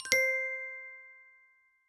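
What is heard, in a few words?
ui success; nc police camera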